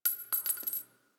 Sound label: Target Practice Shell Casing; gameplay; Warframe; Nineteen Ninety Nine; Quincy